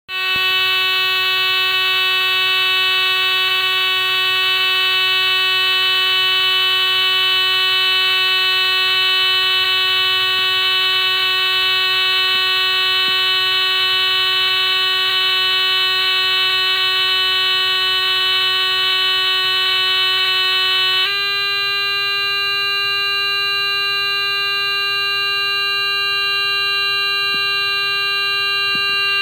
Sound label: MINI BUZZ